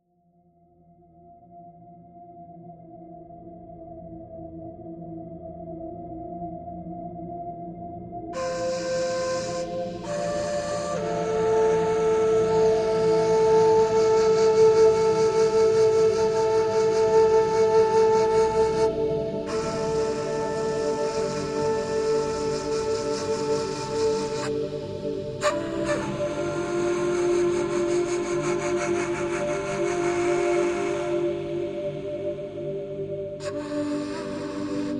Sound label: Calm Spa Music